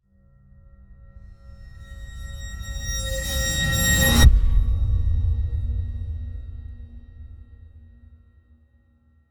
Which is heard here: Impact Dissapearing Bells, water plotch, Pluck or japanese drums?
Impact Dissapearing Bells